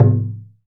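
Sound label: drum, Ethnic Perc; Dance Synths; Percussion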